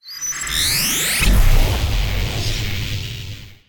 Raio horizontal

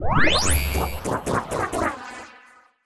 tiro lento

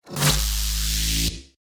Sfx - Laser Prisma; Neon Souls; PS4 PS5 switch windows xbox one xbox series xs

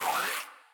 Coruja galho

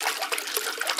Sfx - Deslizando (Loop); Neon Souls; PS4 PS5 switch windows xbox one xbox series xs

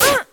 Dano

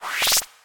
Absorve energia

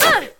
Dano

